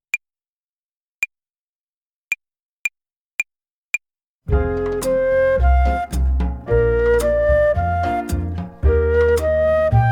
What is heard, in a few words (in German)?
Akkordzerlegungen Übung 4, Play-along Slow, Jazz Club Querfloete